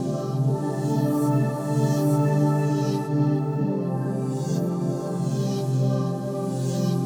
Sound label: Dubwyzz Keys, Indie Rock; Sonic Foundry (Sony Creative Software); Indie Rock Anthems; Session 04 - Dubwyzz